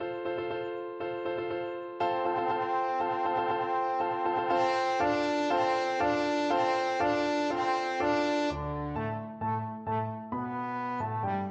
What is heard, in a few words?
trombone